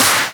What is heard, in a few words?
Claps; Vengeance; Essential Clubsounds Vol.4